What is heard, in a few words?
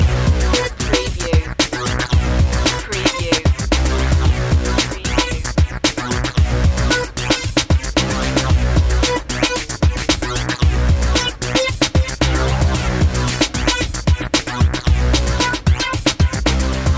urban battlefront loop